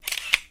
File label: cam click